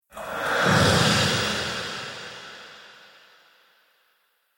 Transition Horror Whoosh Slash Scary Metallic, Transition Metallic Whoosh Slash Scary Airy; Impact Horror; Sound Design; Whooshes; BBFX